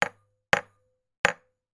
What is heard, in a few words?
foley lightbulb hit table x3